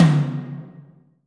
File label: subtle reverb tom sample D key, tome drum sample tuned to the D note